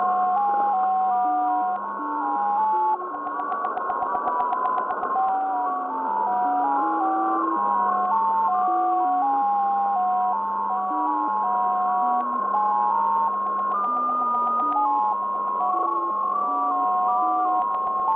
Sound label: qso jt65, 14076khz, Radio